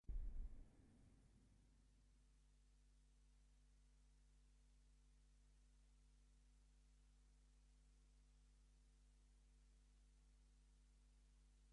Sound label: Orchestra; Dolby Digital 5 1